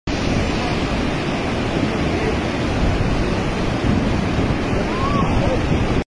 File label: flood